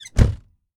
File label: Cars; 1000fx